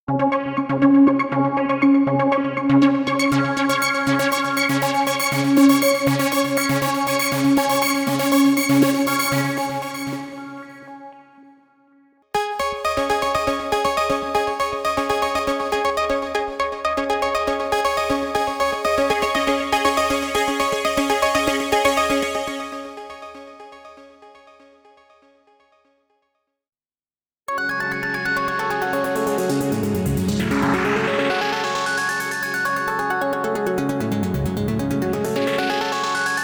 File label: arpeggio; V-Synth GT v2